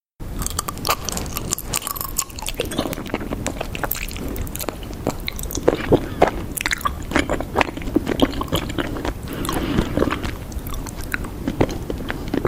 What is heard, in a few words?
asmr